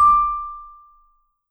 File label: V SHRT MF D5, Marimba & Vibes; Sampleheads; Dave Samuels